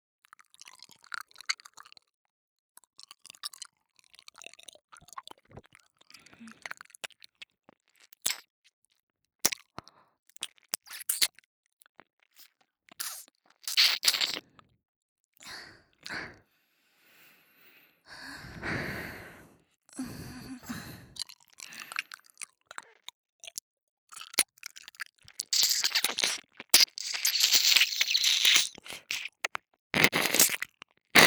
左右同時耳舐め

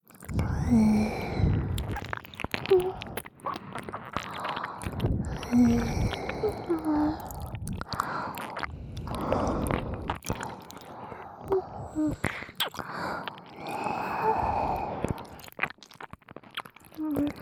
両耳舐めループ; 高額支援額1位の貴方は他リスナーに見せつけ!お○んぽ使った公開生配信で優越感MAXなお射精をしまくる話

